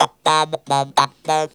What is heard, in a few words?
Funky Vocals; USB Soundscan vol.18; AKAI